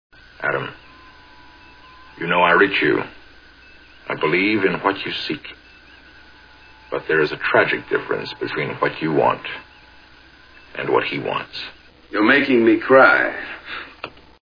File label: cry